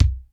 DRY K, drum; Crypton; DrumBase5000

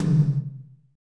TOM, Roland; Northstar - Drumscapes Roland

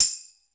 TAMB1, PERCUSSION; Crypton - DrumBase5000; PERCUSSION#1

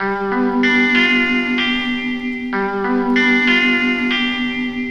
Fake loop 95BPM; radiosonics

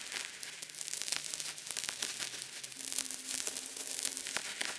Cracklature, sparse soundscape

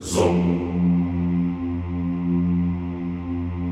ZOOMMM F1, Classical Choir; Best Service; Extended Classical Choir

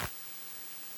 Clap, NES DPCM

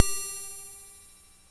cymb, cymbals

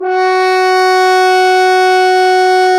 FRH, Horns; Sound & Vision - Gigapack I CD 2 (Roland); Brass&Woods1

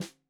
Snare 65 Reg Stereo, Deeply Sampled Drums

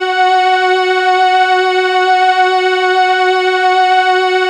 SIEL, String Machines

